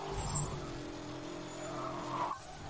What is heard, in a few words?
emp locking someone else; nfs hp 2010 gamescom